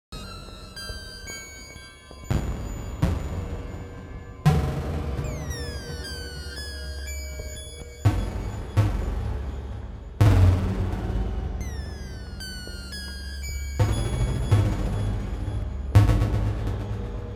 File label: Broken Beats